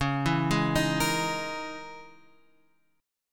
C sharp Minor 6th Add 9th; C#m6add9 Chord {9 7 6 8 X 6} arpeggiated

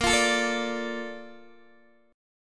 Bb Major 7th, Guitar, Open D

